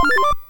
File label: sid MOS6581R2; hvsc